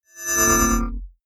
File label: hologram appear, energie